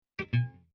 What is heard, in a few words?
guitar hit